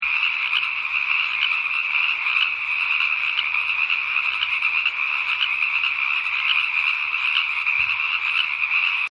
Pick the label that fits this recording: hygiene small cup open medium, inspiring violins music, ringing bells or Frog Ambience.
Frog Ambience